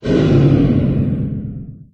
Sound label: hoverboat shut down; There; ThereClient